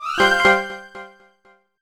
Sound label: Jingle Attention; Virtual Villagers: A New Home; NDS